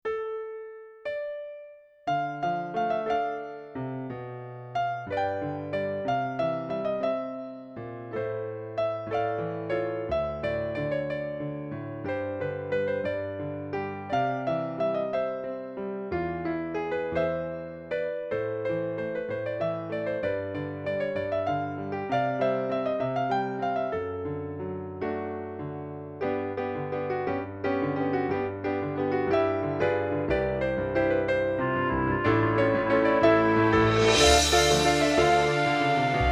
theatre theme music

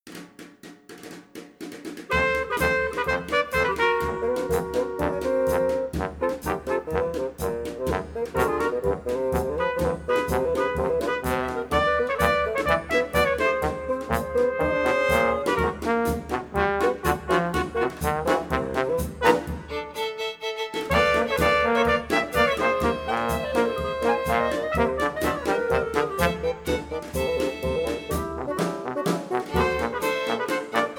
quirky march

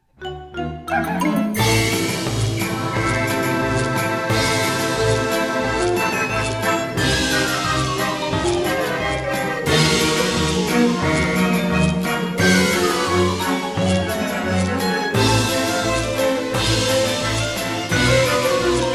light curtain music

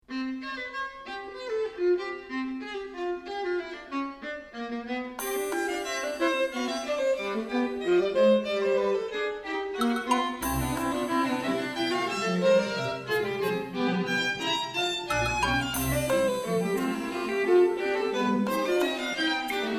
light intermission music

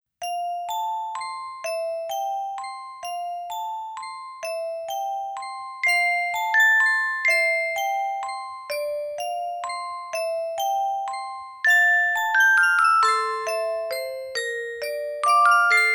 moon melody

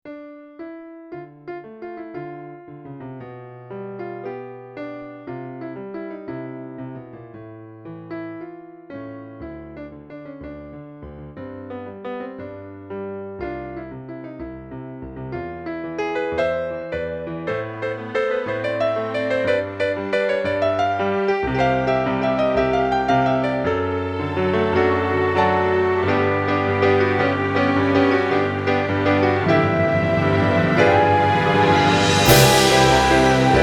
light theatre music big finish

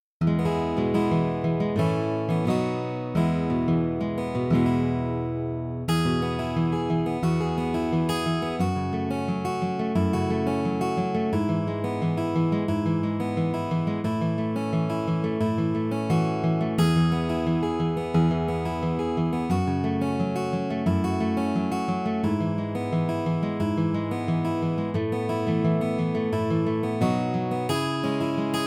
acoustic

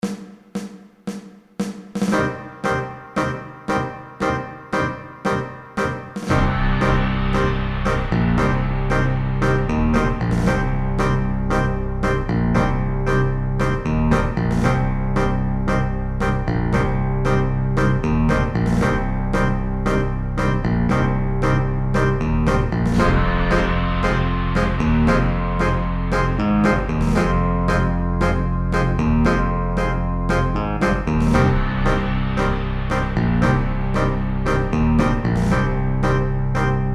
fascist tango

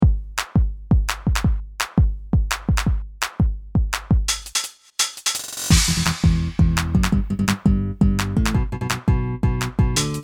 house spy music

